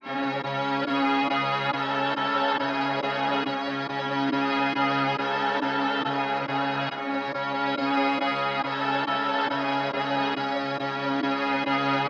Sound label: 139 BPM C# Min Strings; Melodies; Loop Stems; Cymatics; Monarch Melodies; Orchestral; The Mist